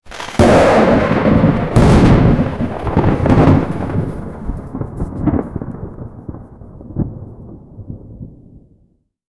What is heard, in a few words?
vivid comic book style th, lightning